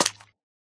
flop, pufferfish; Minecraft

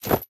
equip chain, armor; Minecraft; neoLegacy

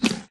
eat, llama; Minecraft

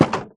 open, fence gate; Minecraft; block

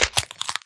egg crack, turtle; mob; Minecraft

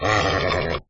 angry, horse; mob; Minecraft

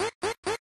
far alert loop; Minecraft

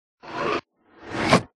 chest; Minecraft

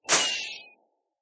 fireworks launch; Minecraft

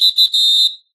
Game End; Minecraft

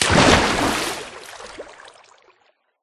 heavy splash, liquid; Minecraft

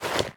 equip leather, armor; Minecraft; neoLegacy

